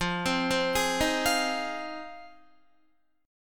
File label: F Minor 7th F Guitar; arpeggiated